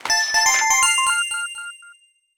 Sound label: Gift Box Open Silver; SM3DL; Kino